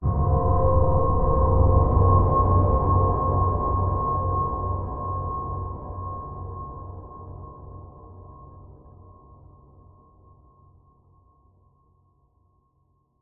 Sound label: horror hit dark ominous scary howling